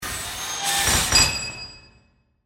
Machine Automatic, Scifi, Machine, Automatic, Bolt, Metallic, Clank, Science, Fiction; Robot